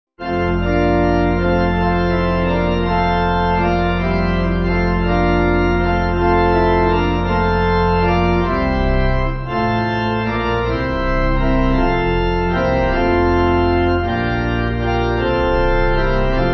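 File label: Pipe, hymn backing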